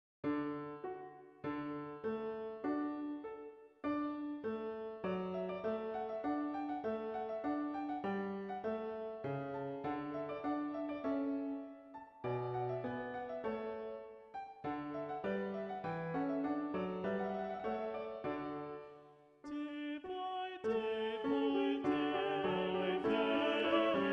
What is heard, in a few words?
Day by day we magnify Thee Soprano; Handel; Te Deum